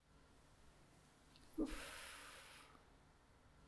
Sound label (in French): Index des soupirs